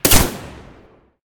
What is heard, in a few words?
commando basic heli; smite; zhongkui